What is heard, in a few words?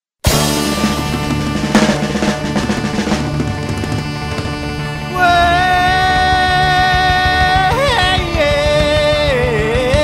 shout